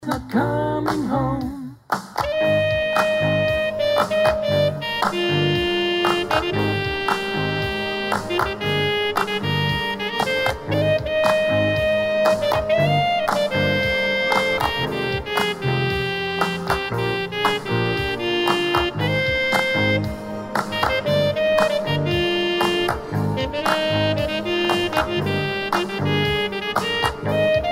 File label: prayer sax wash